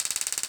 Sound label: Fire, Taser noise